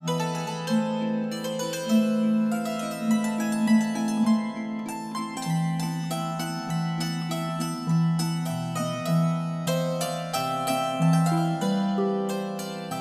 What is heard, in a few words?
Alla turca